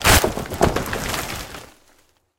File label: tank impact tree